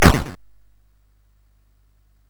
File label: Dark Castle, CSG8580R5